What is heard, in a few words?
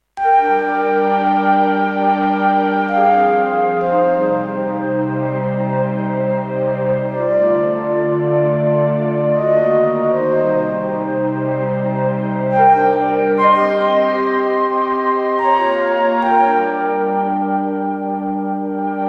Fairlight Flute Strings resampled